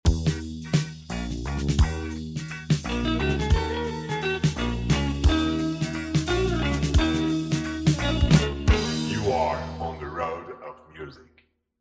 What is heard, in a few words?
Jingle